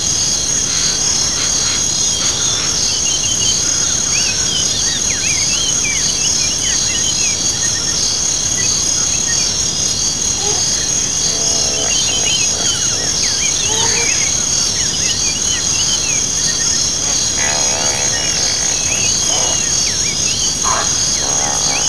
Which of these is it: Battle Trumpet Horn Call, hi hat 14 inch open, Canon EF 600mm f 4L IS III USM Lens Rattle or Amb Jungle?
Amb Jungle